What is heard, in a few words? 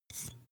glass bottle handstroke soft